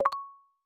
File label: notif